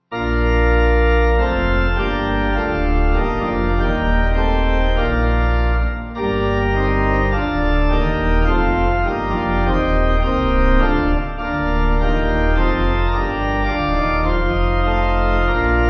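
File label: Pipe Organ, Music Backing Files for Churches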